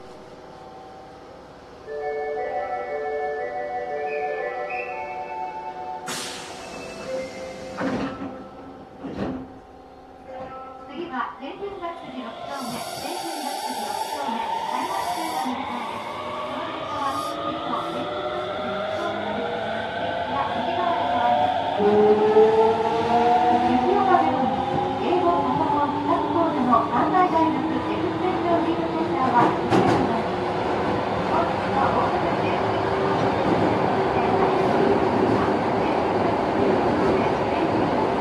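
variable-voltage variable-frequency inverter, Mitsubishi; osaka 6614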